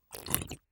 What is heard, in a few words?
Slurp